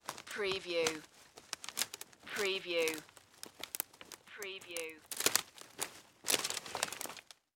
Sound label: Footstep Forest Run